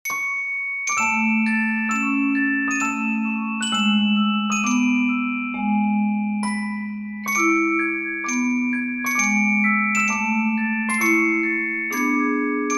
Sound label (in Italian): Carillon; Zio Vanja